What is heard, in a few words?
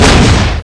bang metal wall; ambience